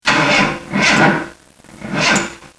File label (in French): Démarrage voiture plus de batterie